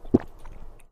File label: gulp; animal